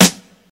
airy snare one shot g key, acoustic snare tuned to the G note; snare drum samples